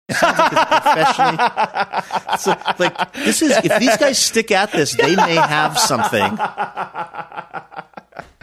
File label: having good laugh